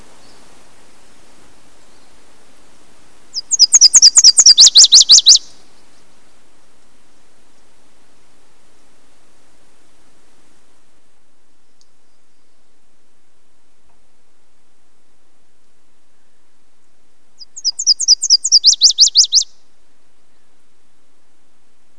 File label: Nashville warbler, bird songs of WA calls